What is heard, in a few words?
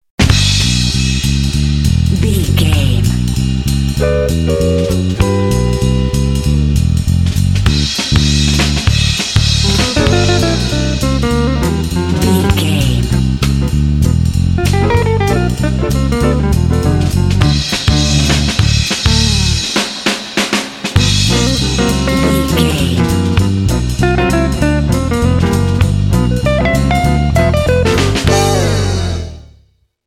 jazz retro groovy guitar